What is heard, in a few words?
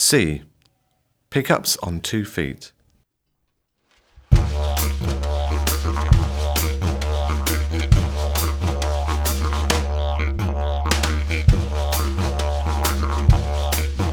Pick Ups on 2 feet